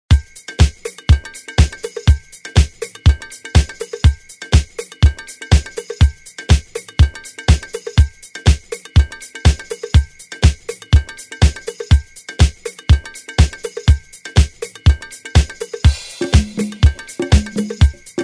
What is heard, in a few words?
Latin Disco Anthem